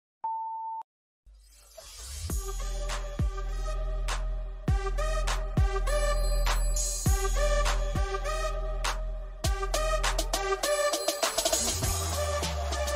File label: Floor Music; Shorter Ovations